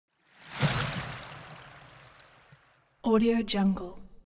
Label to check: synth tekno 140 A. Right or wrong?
Wrong